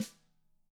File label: DW HIT1 PD-L, DRUMS SNRD; ILIO; Double Platinum Drums 2